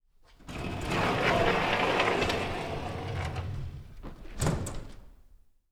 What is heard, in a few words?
Shutter Door; 99Sounds; The Warehouse